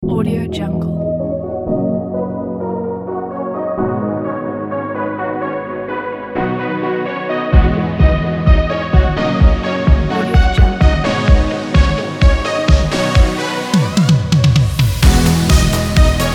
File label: 80s inspired kit